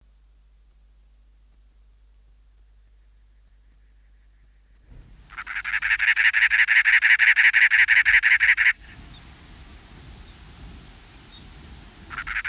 cactus wren, songbirds

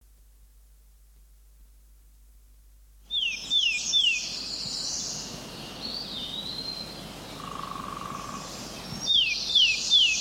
tufted titmouse, songbirds

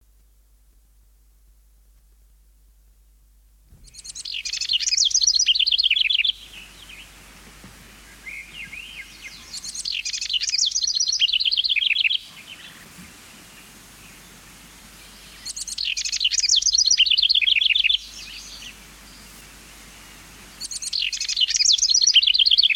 house wren, songbirds

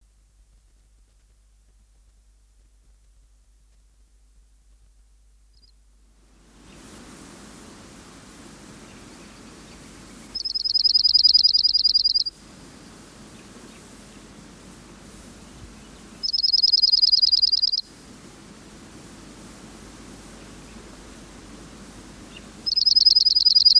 dark eyed junco

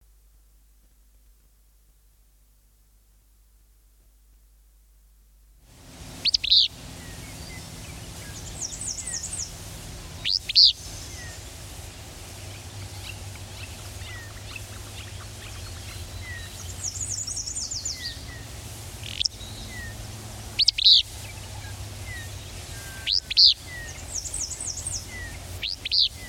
willow flycatcher, songbirds

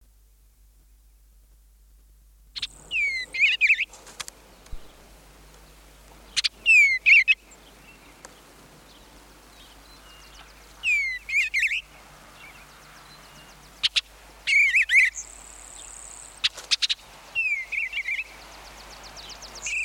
eastern bluebird, songbirds

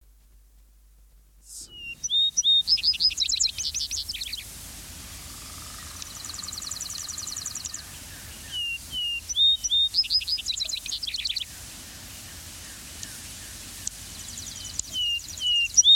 vesper sparrow, songbirds

